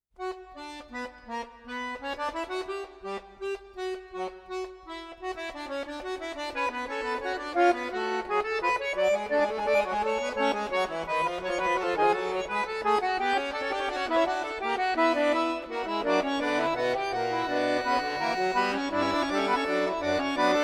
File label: Fugue in B minor